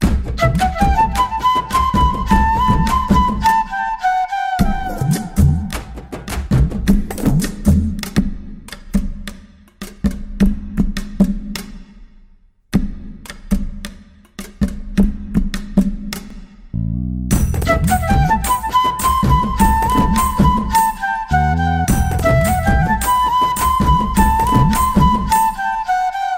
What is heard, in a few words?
Flute Version Tamil Ringtone